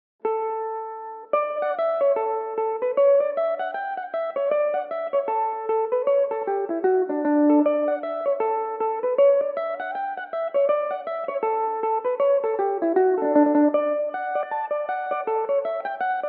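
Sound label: reel D major, irish mandolin, tunes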